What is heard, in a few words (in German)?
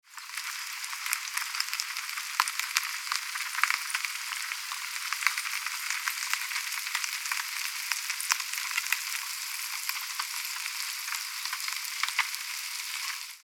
aare steine reise dampfzentrale filter